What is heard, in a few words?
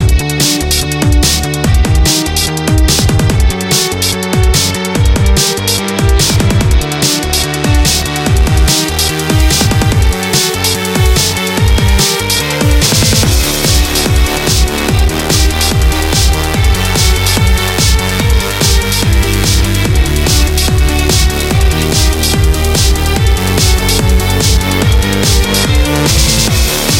Boss Music; Shatter